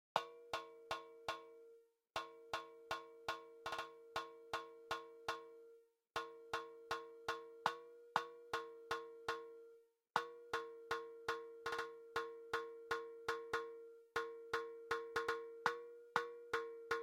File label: PERC